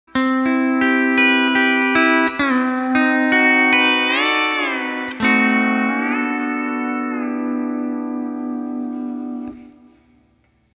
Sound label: D13 Pedals 4,5,6,7; D13 Copedant Tabs